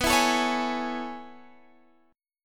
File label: A Major 7th B; strummed; JGuitar